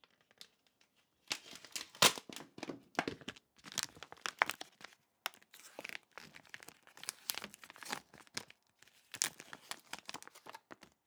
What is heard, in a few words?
Unwrapping Cheese; The Burger Kitchen